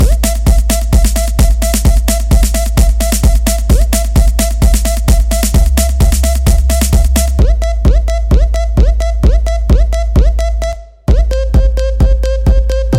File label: beep latin beat; to your mobile phone